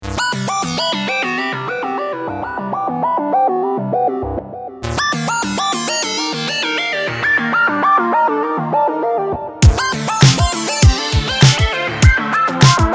bleep city, arpeggiator and midi; logic pro

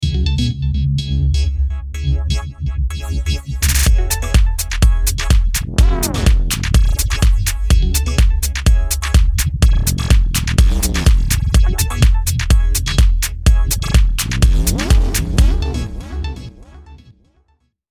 tech house, ringshifter; logic pro

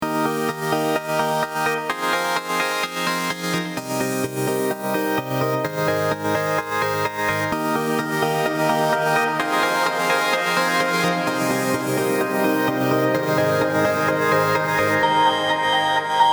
space designer synth riff; production effects; logic pro

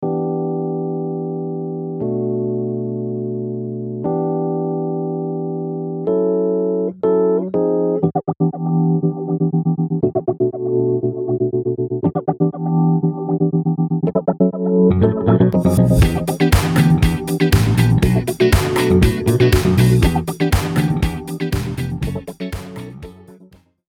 logic pro step fx

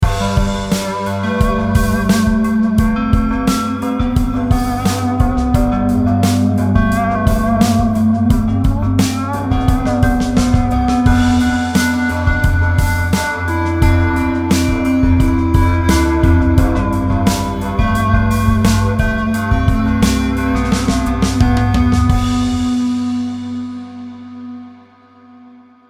buzzy string space, sculpture; logic pro

